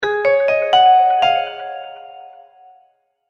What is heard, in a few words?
multimedia logo, Musical Identity/Signature; Short Musical Signature / Ident; Sonic Logo; Sound Design; McKinney Sound